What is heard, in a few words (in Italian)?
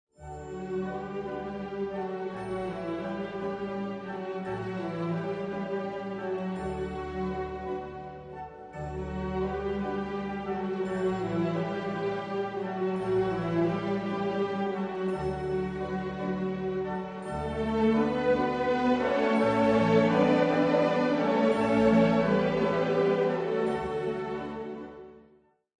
barcarola; barcarola di J. Hoffenbach nell'opera I racconti di Hoffman